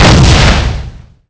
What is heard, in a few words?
Fire, weapons; Soldier Of Fortune II Double Helix; XBOX; silver talon